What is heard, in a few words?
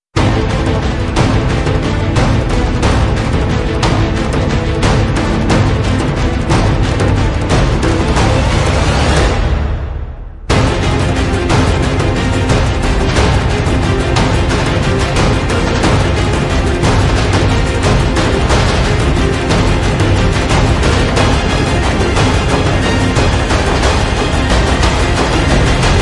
Dragon Attack, Pounding Percussion, Taikos; Pounding Percussion vol. 3